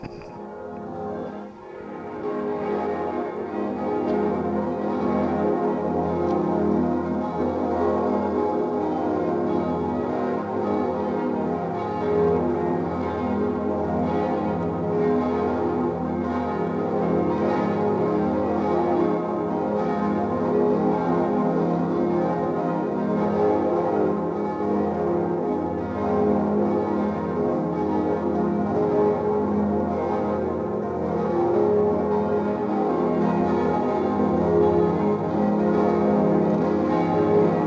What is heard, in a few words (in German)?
Glocken; speyer; gedaechtniskirche